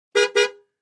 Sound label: Aston Montego Horn; aston montego ext. horns